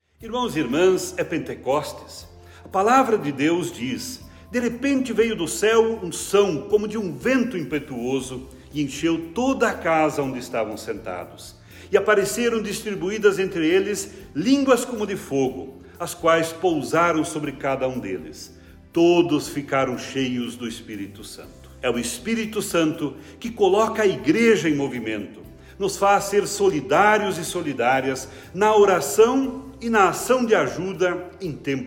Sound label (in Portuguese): Vinheta